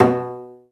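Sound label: Cello Pizzicato B2; Digital Sound Factory Virtuoso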